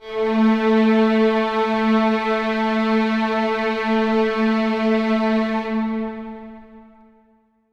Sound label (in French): orchestre